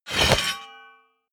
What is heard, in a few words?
metal blade large pickup